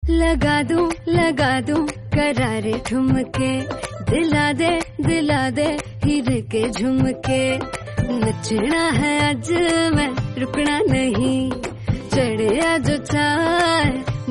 Hindi Song; Jeetu Bhaiya

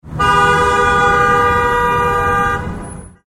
car horn honk

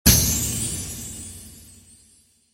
magical explosion

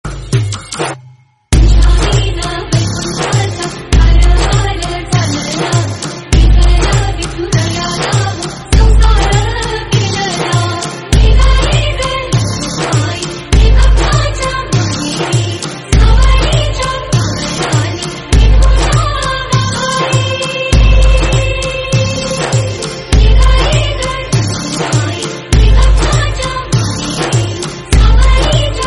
Marathi Devotional

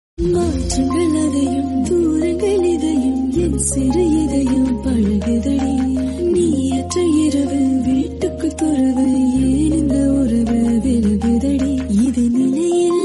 Female Version

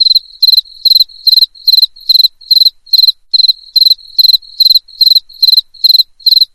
crickets

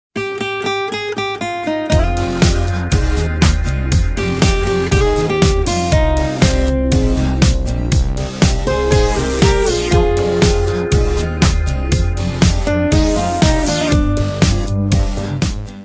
guitar

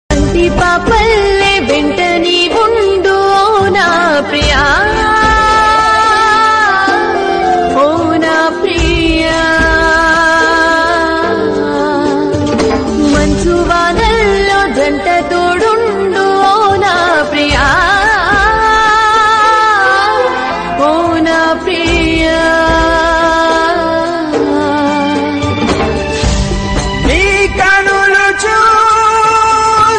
Telugu Song; E Tharam Illalu Serial

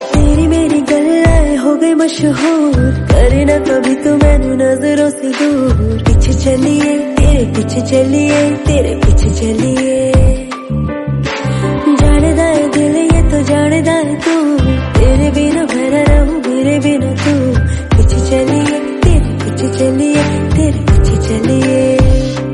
Female Version

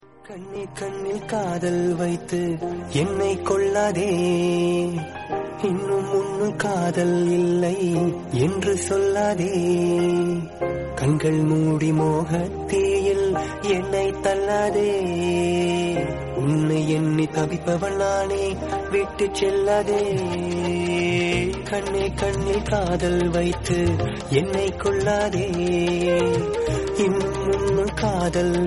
Tamil Love Album

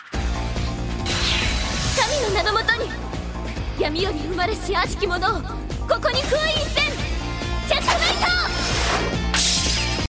ringtone

